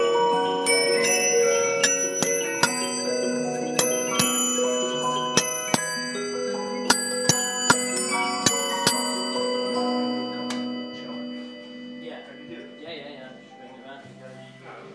1860 music box

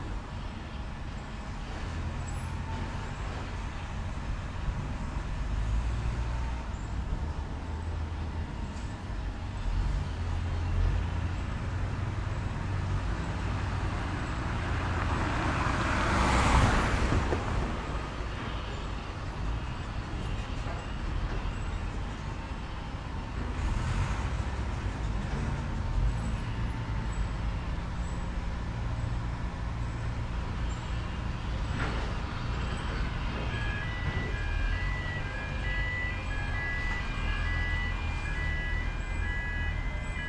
metal works; howco

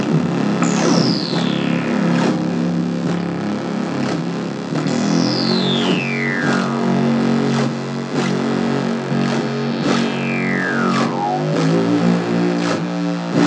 evil bass test

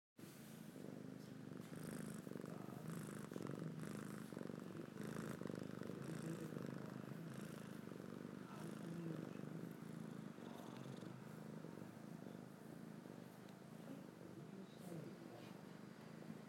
kitten purr

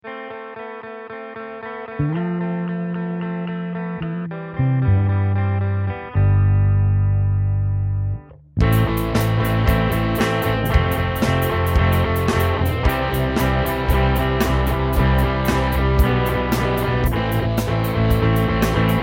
drumming garage band

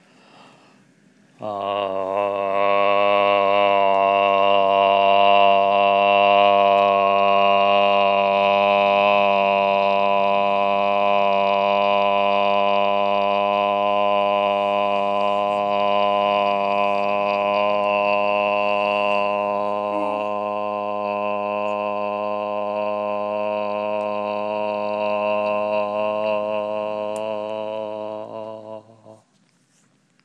a really deep resonating aaaahhhhh sound; seems to calm roo

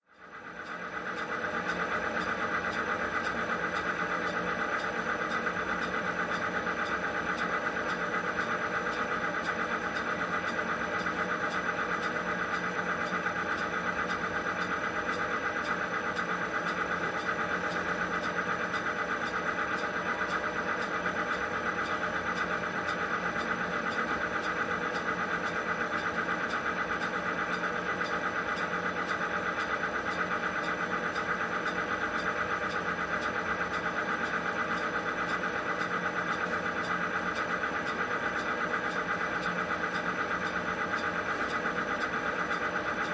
circulation fan parking garage; sounds of the city

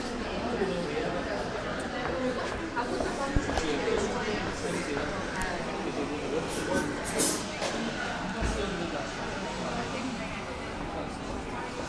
the sounds of cafe; rouge

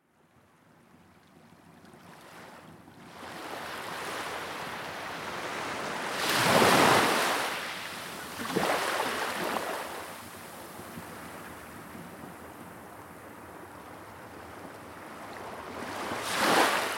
breaking waves at Broad Strand Co Cork

